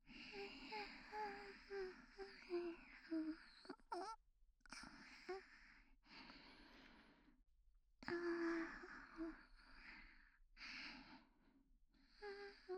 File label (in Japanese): 寝息・彼氏と一緒に穏やかに眠っている彼女; おとなし少女系カノジョ